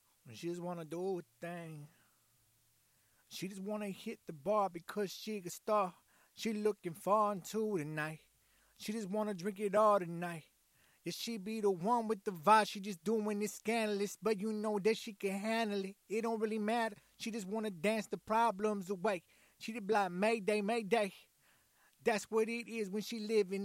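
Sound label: Hip Hop 100 A major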